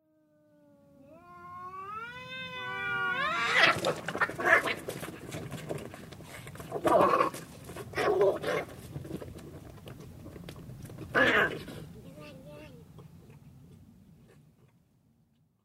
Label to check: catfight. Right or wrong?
Right